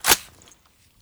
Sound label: m4a1 bolt release, weapons; garrysmod; m4a1 customizable